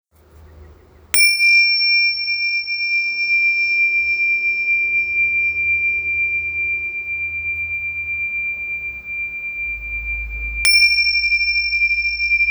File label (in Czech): cinelky bronz stredni ohm; tibetske cinelky stredni bronzove